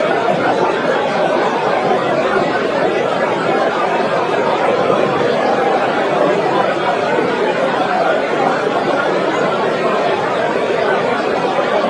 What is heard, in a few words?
crowd